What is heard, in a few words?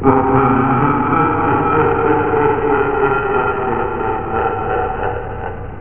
Long Laugh; Curse of Dragor